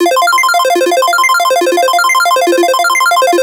Arp F 140, Melodic Loops; FastArp; Ghosthack - Color Bass Essentials

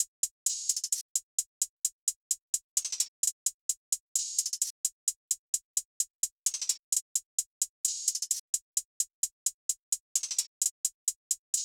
Hihat Loop 130 BPM; trap; Drum Loops; Drama Hihat Loop; Cymatics; Trap Starter Pack

